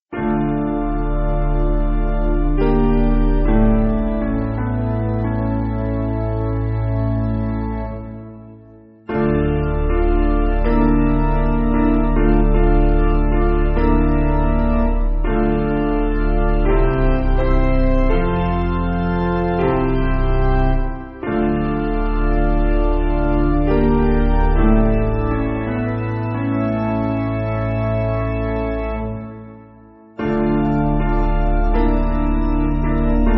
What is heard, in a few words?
Piano; Taize